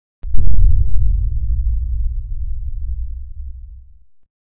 Sideways Town Giant Floor Panel Arrive Rotate Lock LFE; Boomtown; Titanfall 2